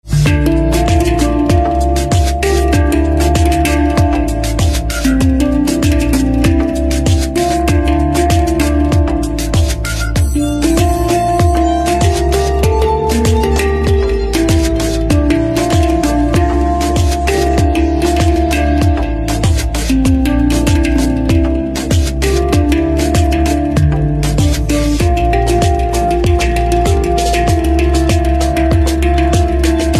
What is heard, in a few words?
Handpan Instrumental Ringtone